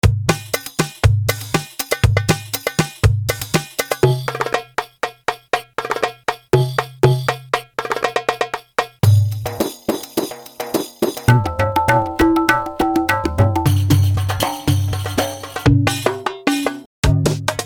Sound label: arab percussion loops